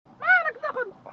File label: music